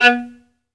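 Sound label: SOLO VIOLIN, Synclavier Strings; ILIO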